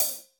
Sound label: HH, Drums; Bob Clearmountain Drums I